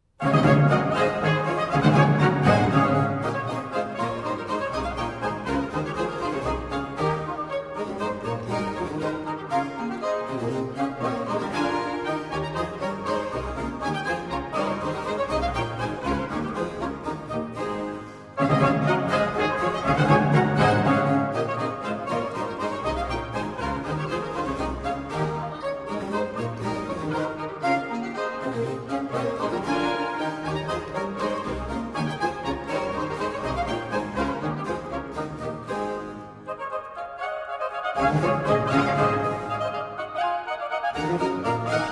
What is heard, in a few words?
Orchestral Suite in D; Orchestral Suite No. 4; BWV 1069; V. La Réjouissance